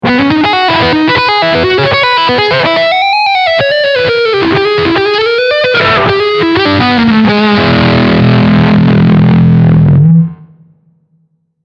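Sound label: Lick Distortion Front Humbucker, guitar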